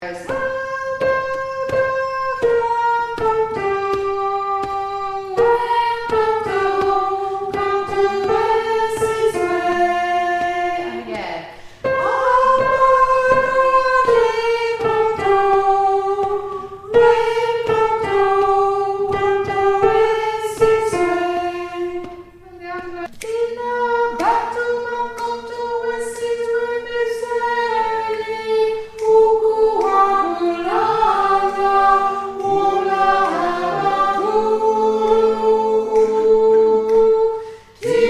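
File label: soprano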